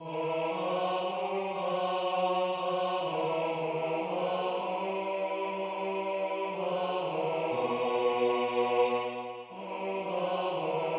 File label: Tenor Bass; Ha mi i di verliebt